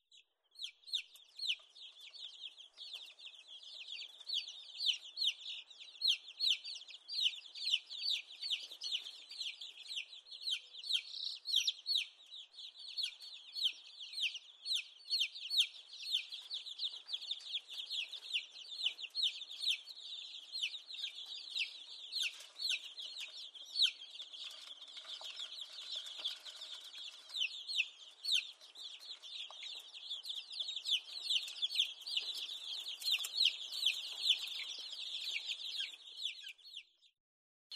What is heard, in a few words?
CHICKENS CHICKS EXCITED PEEPS CHIRPS CAGE MOVEMENT; wildlife sounds